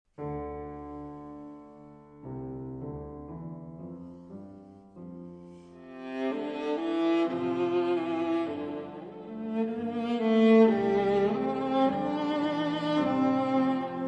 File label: Concert Piece; Enescu